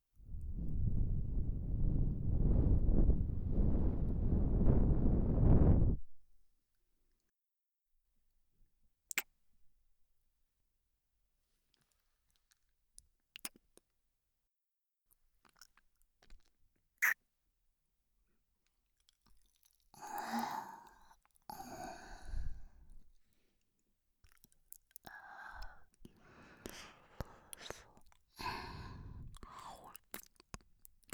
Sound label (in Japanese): 耳舐め, 効果音なし, 左耳